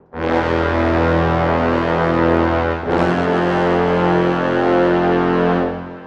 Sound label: Dramatic Brass